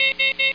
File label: Taget Locked; Final Frontier